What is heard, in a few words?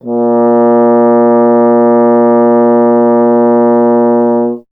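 bass trombone; Miroslav Vitous - Solo Instruments VOL-1; 90 s Sample CDs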